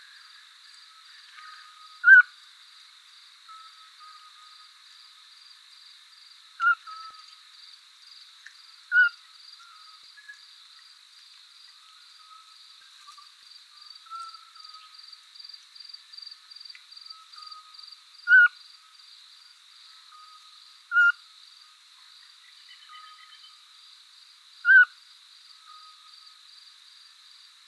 call, scops owl, Background: Scops Owl calls and Nightingale song.; non rhythmic pattern; otus scops; in contrast with the precise rhythm usual for Scops owl calls